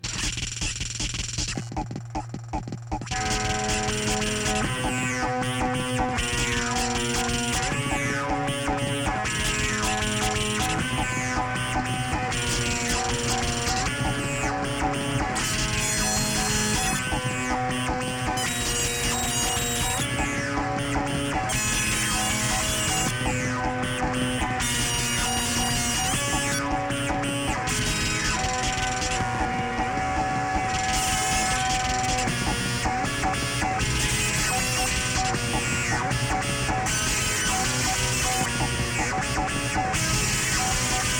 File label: bizarre keyboard rock